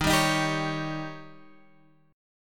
Eb 7th, Guitar, Open G

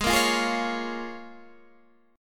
A sharp Minor 7th, G sharp

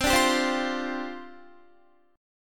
C Suspended 2nd Suspended 4th, down, Guitar; Csus2sus4 strummed

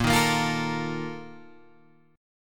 A sharp Major 7th Suspended 4th, Guitar Open G

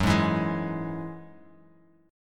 Gb 7th Suspended 2nd Gb Bass Standard; strummed; JGuitar

